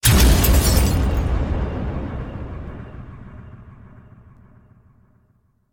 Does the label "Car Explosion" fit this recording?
Yes